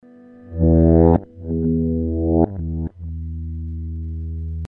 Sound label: warp